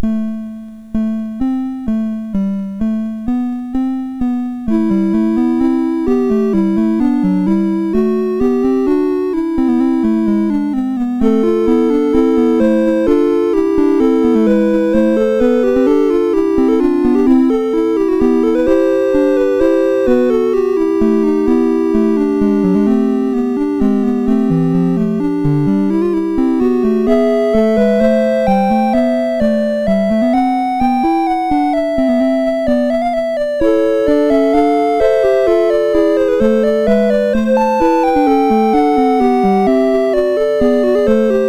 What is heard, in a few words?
Choral Prelude, MOS 6581; MOS6581R2